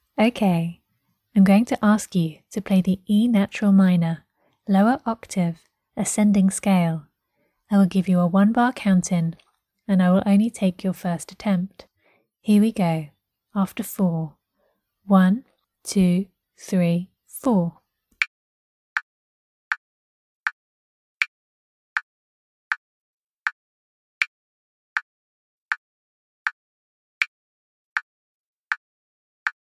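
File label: e natural minor lower ascending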